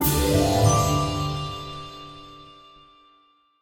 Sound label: small Win; netent; secretofthestones